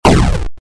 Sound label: boom; Robot Wants Fishy